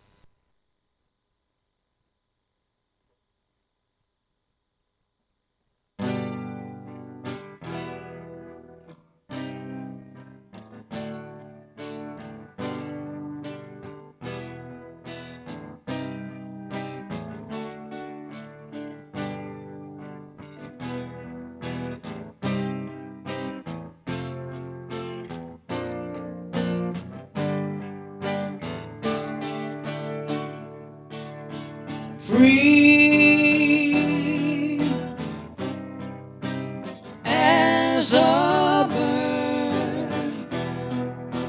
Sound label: vocal